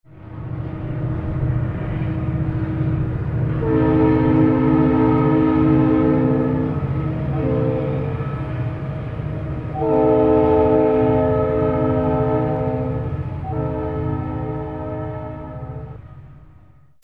TRAINS

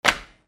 FALLING

